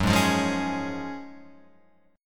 F sharp Major 7th Suspended 2nd, F sharp, down, Guitar, Open F; strummed; JGuitar